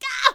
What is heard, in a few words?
Death Vocals; Radiant Glaive